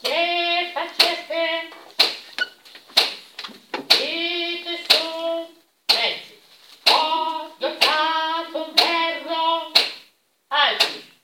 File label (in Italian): soprani; che faste